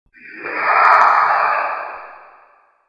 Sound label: die